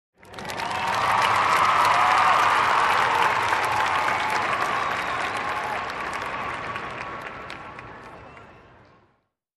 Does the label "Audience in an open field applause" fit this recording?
Yes